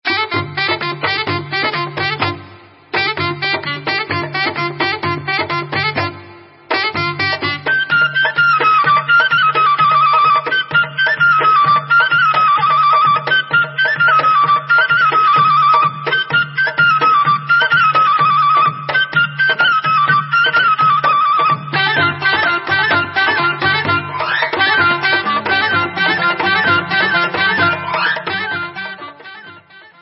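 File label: Instrumental, Israeli Dances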